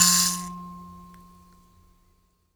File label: PLK Bush Mbira; Roland LCDP11 Africa VOL-1; M'biras